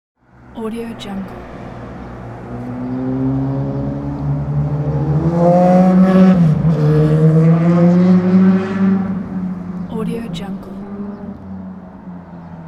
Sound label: sport motorcycle; zdravinjo